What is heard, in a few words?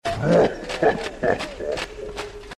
hunter laugh